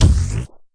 fx drum

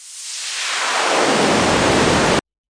noise Modular, Noise Sweeps

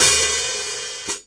wideopen closed hh; Hats; single hits

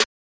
Snare, Hip Hop Kit; drums; STYLE KITS (Hip Hop, Etc)

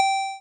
coin, 8 Bit Videogame; LO FI and 8 BIT KITS

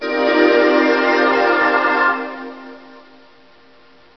Crystal Pad

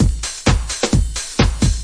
candy house, 130 bpm, drum loops and breaks

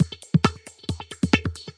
135 smooth robot pipper3, drum loops and breaks

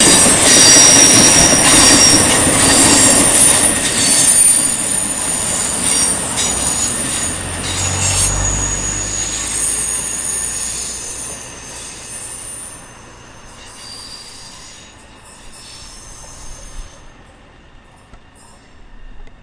train squeal stop irritating; Sound FX Real Life

